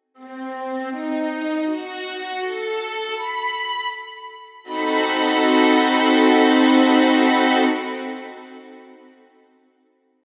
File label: chord st dim7